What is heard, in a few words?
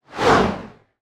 Fireball Whoosh